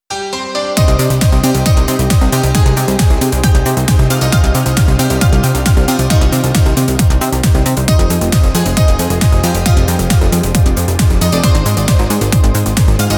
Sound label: Trance version, ringtone